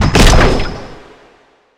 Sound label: asteroid Boom; Space Wave Race